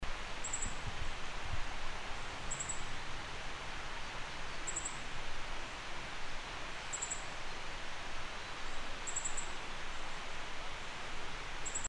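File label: Asian stubtail call note; yabusame jinaki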